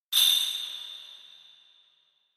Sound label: Kagura Suzu